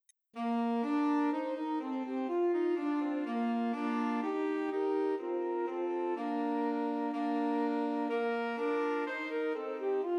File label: Ding Dong Merrily on High, Christmas Trios for Flute Alto Sax and Tenor Sax